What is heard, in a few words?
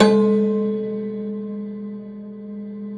Rubbery Piano, prepared piano; Total Piano; Rubbery Piano 2 +ped